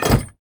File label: tower deploy; paladin Covenant